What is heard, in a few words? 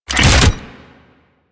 Rifle Reload; Weapons; Warframe; Thanotech